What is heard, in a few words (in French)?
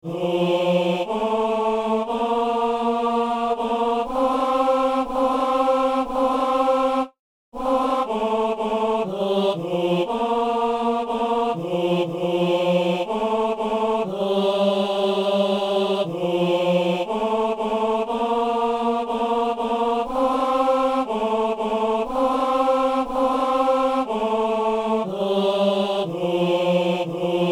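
ténor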